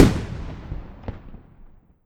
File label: FIREWORKS Rocket Explode Mono